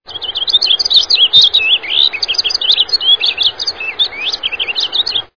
roselin cri

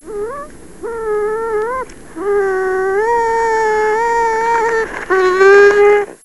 ragondin cri

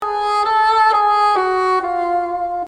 basson son

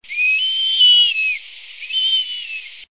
gypaete barbu cri, cris d animaux

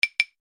claves son

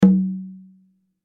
tumba son

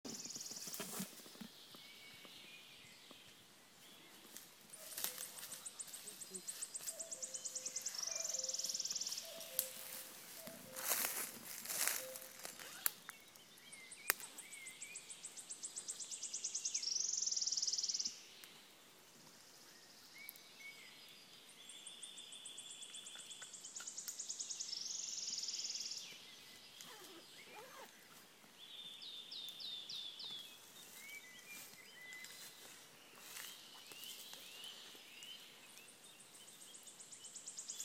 Pouillot siffleur; iphone

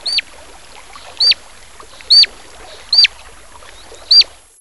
loutre cri

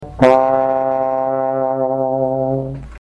trombone son

